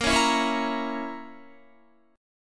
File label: A sharp Diminished Guitar, chord; A#dim; strummed